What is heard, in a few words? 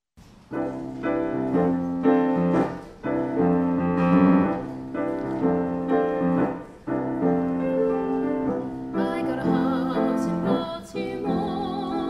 female; Liza Jane